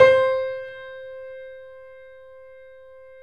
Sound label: C, 3-D Audio Collection, 3D Pianos, Yamaha C7